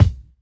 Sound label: fat kickdrum b key, Royality free kick drum tuned to the B note. Loudest frequency: 225Hz; kick drum samples